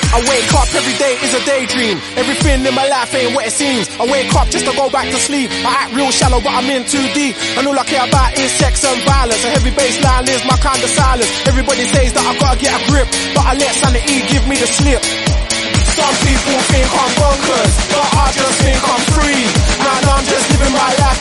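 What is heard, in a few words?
REDRUM 126bpm B minor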